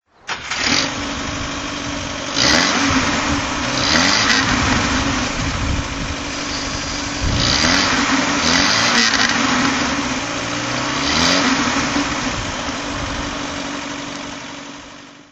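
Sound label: mercedes benz 180 throttle, engine sound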